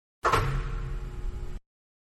Basketball Swish, Indoors; Sports; Manhattan Production Music

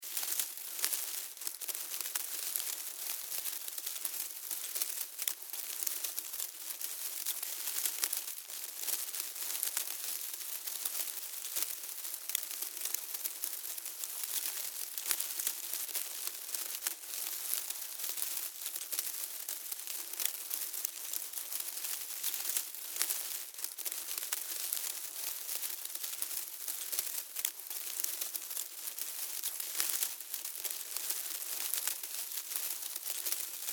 Ants, Colony, Bugs, Movement, Insects; Animals:Insects; Forage, Dig, Foliage, Leaves, Multiple; Blastwave

